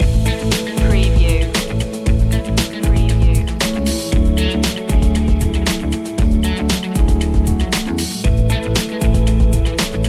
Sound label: fancy mood loop